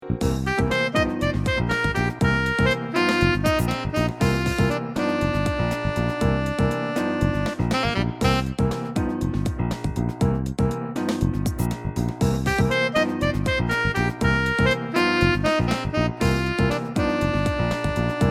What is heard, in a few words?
sax